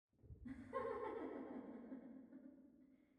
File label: ambience room witch lodge laugh